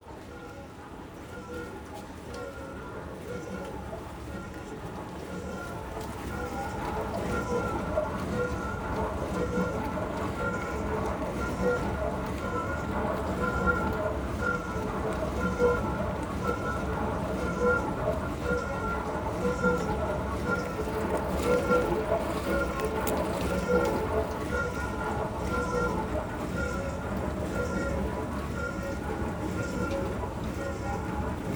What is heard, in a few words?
dishwasher, noise